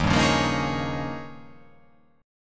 Eb 11th Eb, down; strummed; JGuitar

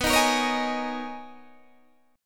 B Major 13th B down Guitar DADGAD

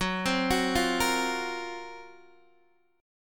Gb Major 7th Flat 5th, down, Guitar, DADGAD

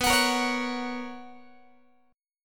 B Major 7th Suspended 2nd Guitar, chord; strummed

